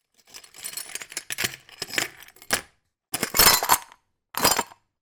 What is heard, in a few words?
foley glass broken bits movement drop in plastic dustpan; zapsplat